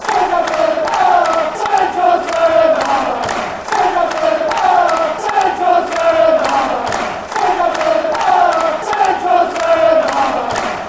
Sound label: la la la, fanchants; st johnstone